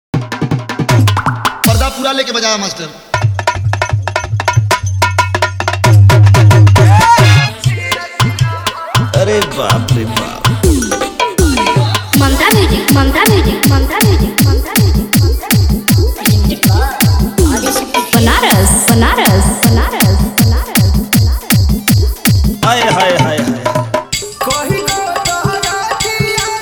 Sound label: Holi Dj Remix Song